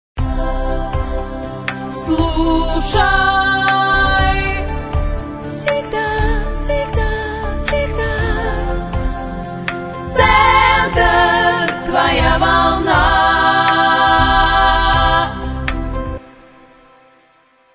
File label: Jingle for cellphones; Radio Center